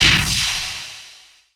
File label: rocket; Godot Project to remake Tailgunner